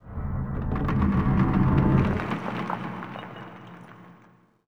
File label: city occasional